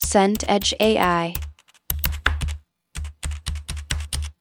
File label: keyboard type